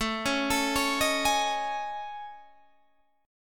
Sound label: A Major 7th Flat 5th, down Guitar Half Step Down